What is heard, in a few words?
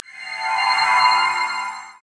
healing shower